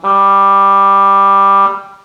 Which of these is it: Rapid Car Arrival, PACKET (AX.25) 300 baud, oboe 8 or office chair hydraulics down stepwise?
oboe 8